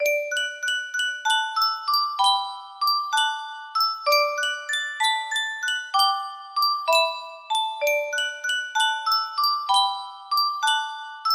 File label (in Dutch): Yunsheng muziekdoosje